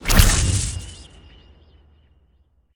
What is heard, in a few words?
gungnir charge; smite; odin